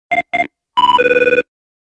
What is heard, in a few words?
Intercom